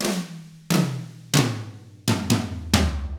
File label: SLOW ROCK IN, Drums; ILIO; Double Platinum Drums 1